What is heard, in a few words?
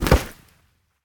minion bodyfall; smite; ahpuch skin10